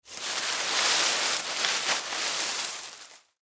Leaves Foliage, Foley Leaves Foliage Movement; Forests And Jungle Sound Effects; Blastwave

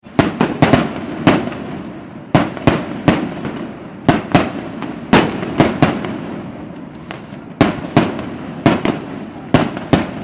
fireworks display loud explosions; Fireworks Display Series Of Explosions; Backgrounds

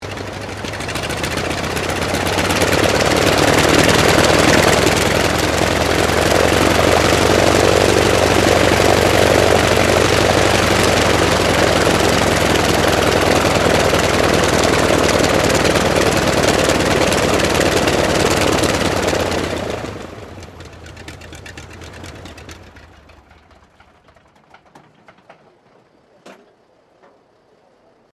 AIRCRAFT CAC WIRRAWAY SHUTDOWN; CA-16 Wirraway; Airplane Sound Effects; Airplanes (Sound Effects:Vehicles)

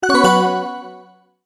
Multimedia Tone-Return/Back; Tones; Sound Design; McKinney Sound

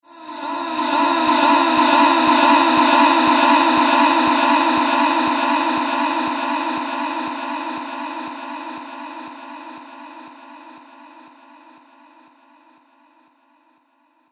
Female Space Orgasm, sci fi music

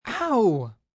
Pain Owww, Pain, male; VoiceBox

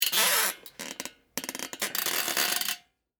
Squeak Metal Springs, Bicycle, Seat; Texture; BlastWave

